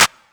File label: Clap